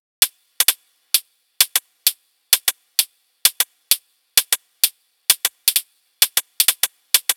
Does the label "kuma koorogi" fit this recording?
No